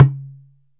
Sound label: Roland SPD-20 perc tabla